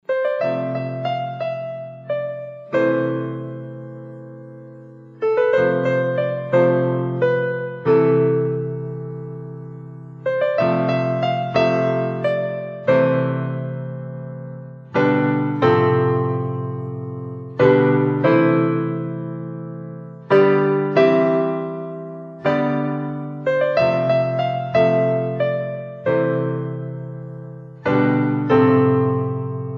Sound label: sad melody; Scrubs